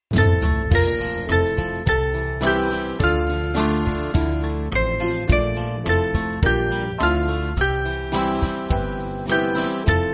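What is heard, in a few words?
Band, Music Backing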